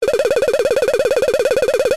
cartoon shake fast wobble

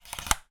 radio aerial telescopic retract

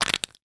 horror eyes gouged out; sound effects one

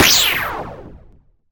sound design blast away lite whoosh; zapsplat

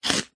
human eat crunch bite single dry cereal piece

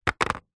household plastic disposable cup drop; zapsplat

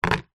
foley plastic container set down on concrete; zapsplat

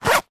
foley purse leather pouch zipper; zapsplat

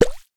comedy bubble pop; sound effects one

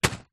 food packet pasta drop down on surface

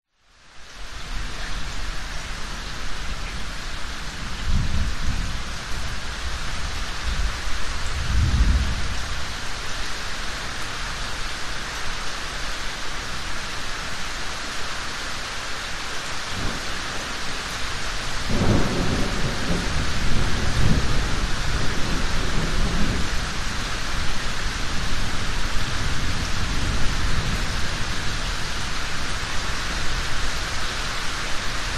nature rain thunderstorm close rain drips yard

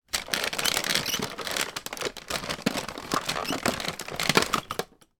household vacuum cleaner upright movement creaks; sound effects one

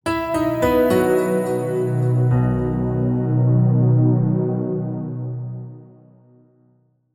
multimedia signature corporate

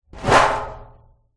metal scrape resonate garage door; foley

